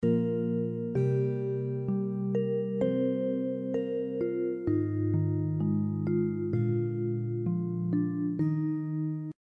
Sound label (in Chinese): 茶厅音乐